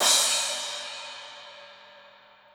Cymbals

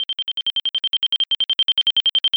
Time bomb

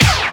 combo break

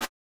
Drum Snare with low attack

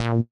A#3 bass

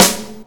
drum hit clap; osu

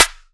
normal hit clap

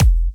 kick

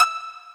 Pizzicato; osu

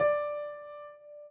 piano

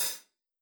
Hat A1

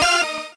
normal hit normal

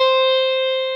Arp. 8

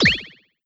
combo break

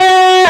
Left-hand vibrato; osu

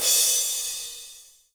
drum hit finish

